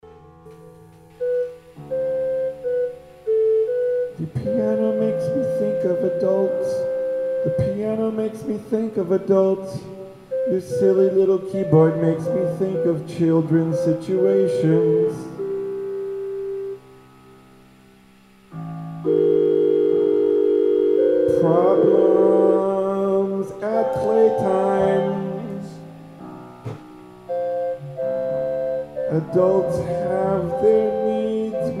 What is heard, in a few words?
The Sound Piano